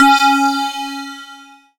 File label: C#, BELL GONG, Synclav FM; Big Fish Audio